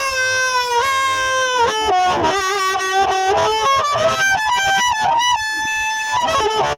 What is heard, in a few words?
BLUES HARP, AMB SOUNDS